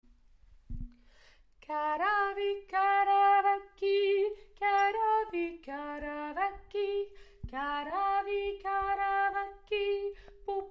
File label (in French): Basses